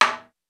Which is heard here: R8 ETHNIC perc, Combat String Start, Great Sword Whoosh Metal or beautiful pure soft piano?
R8 ETHNIC perc